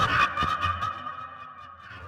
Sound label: centipede laugh; fowl-play; elder-centipede; enemies